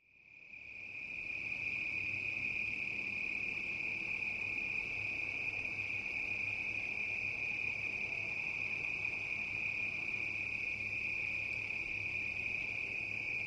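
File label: Multi Crickets Medium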